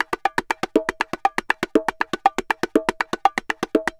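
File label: BONGO SALSA, salsa drums and percussion; Best Service ProSamples vol.14; AKAI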